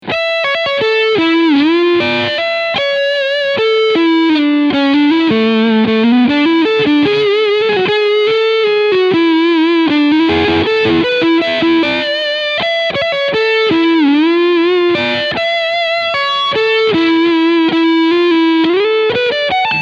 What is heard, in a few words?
G 280 Select Amber Overdrive Neck Mitte SC; Cort